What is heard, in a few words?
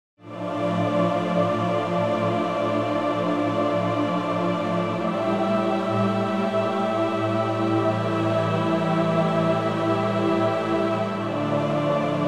pad Syn Drone Epic; BLUE II